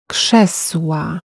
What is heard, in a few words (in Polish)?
krzesla